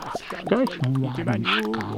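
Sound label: Music Village Calm Inside; from dust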